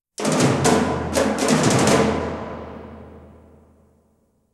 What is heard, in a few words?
Percussion; Rambo - First Blood Part II